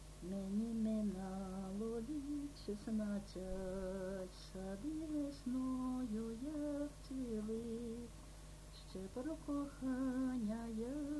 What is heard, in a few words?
meni mynalo lit 16